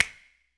HMM, Human Perc, Body Perc

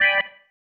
Stab One Shots, Organ

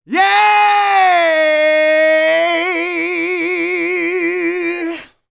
R ROCK, MALE HUMAN; R&B & Dance; ILIO; Vocal Planet

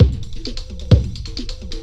FILTER, Big Beat Drumloops

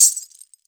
MIXED PERC; 90 s Sample CDs

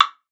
WOOD B, PERCUSSION; Best Service ProSamples vol.42

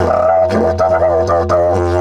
120, Didgeridoo

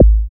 BD ANALOG, Synth; BDs; Sound & Vision - Gigapack II CD 2

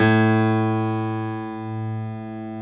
PF STB A 2, S GRAND PF

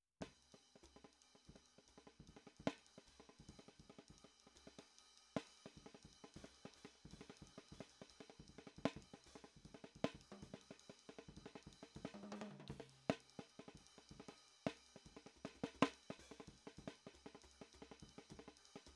SNARE, DRUM N BASS:JUNGLE BEATS; RAW MULTITRACKS

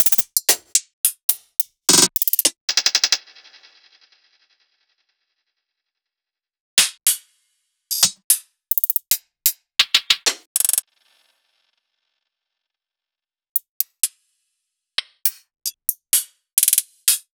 Two Shots Hat

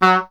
E. Horn

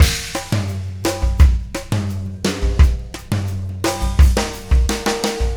Beat, Loops

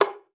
G#4, alto sax; Xsample VOL-2

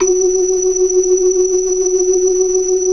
78 BLOOD L 4, ORGAN; Keith Emerson Signature Series

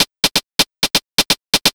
127 DRM, Fresh Disco House; USB Soundscan vol.20 - Fresh Disco House I

